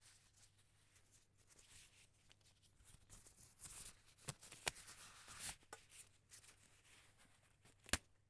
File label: Emergency Gloves Surgical Gloves Putting On; emergency effects; AdobeCC